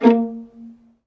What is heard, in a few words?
VIOLIN; ILIO; Synclavier Strings